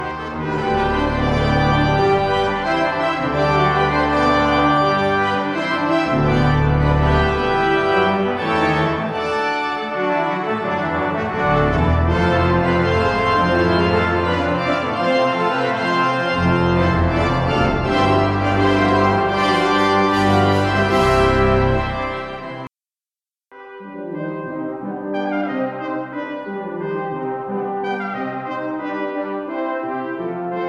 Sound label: organ and quintet